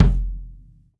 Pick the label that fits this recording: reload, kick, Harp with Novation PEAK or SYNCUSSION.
kick